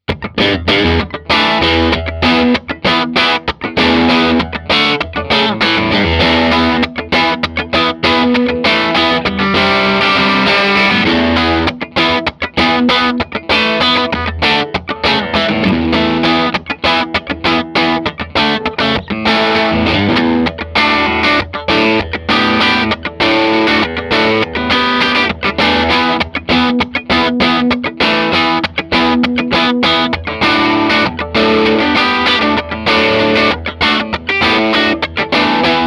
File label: Morgan PR12 Crunch